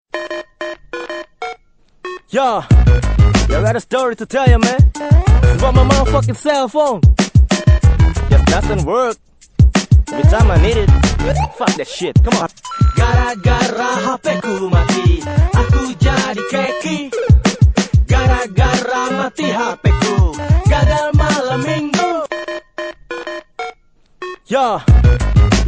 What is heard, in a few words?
rap; super mario